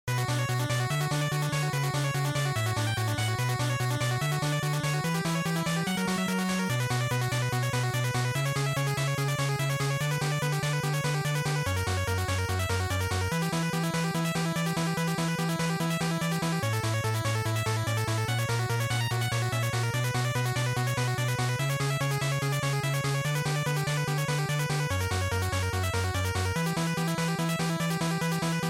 GB Action Boss

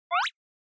Anime Motion

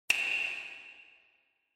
Finger Snap Foley Reverb

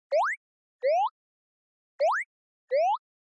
Anime Motion Phrase

